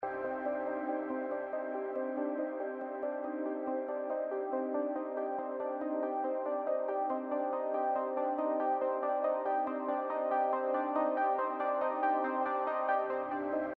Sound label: intro loop